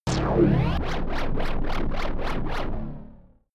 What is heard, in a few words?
shot wobble lurking